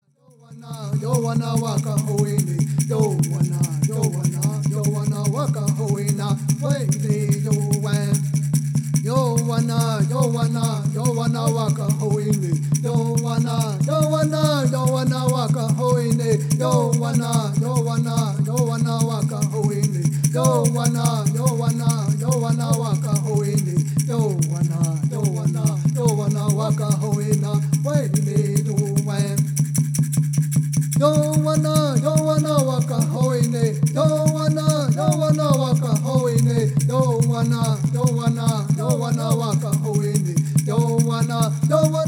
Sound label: Peyote Song